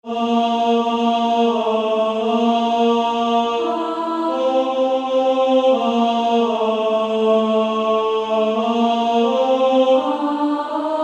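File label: Female Choir, alto 2, Practice Music; SSAA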